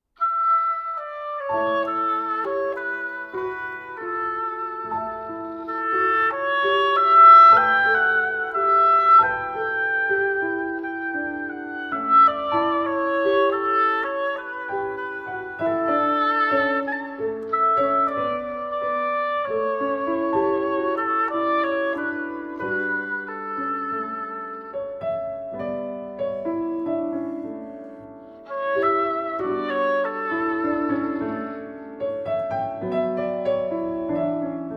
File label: For Oboe and Piano; Ponteio No. 39 Dengoso; By Osvaldo Lacerda